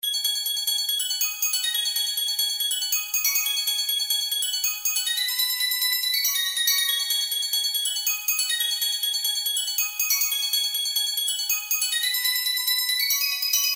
Sms Tune

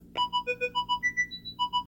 startup tones; Etrac

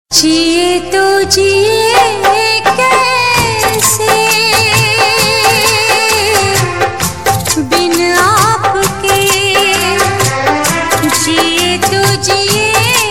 Lovely Sad Tone

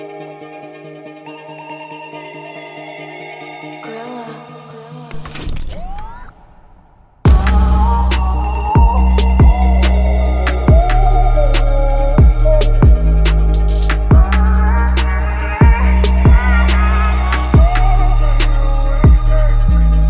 apex beat

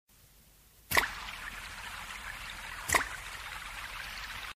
New Rain Msg Tone

